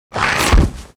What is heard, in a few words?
die